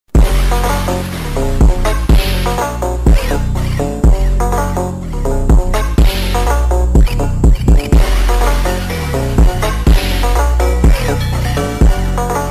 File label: Remix